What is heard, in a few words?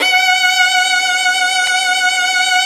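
violin vibrato, Orchestral; Violins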